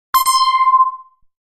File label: feedback correct